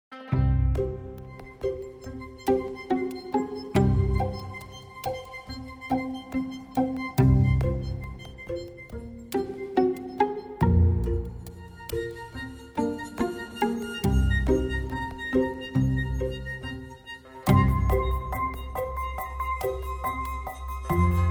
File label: Crawling Creatures